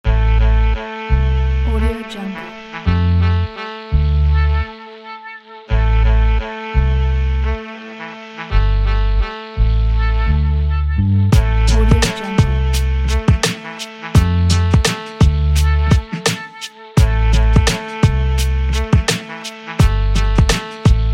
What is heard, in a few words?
hip hop instrumental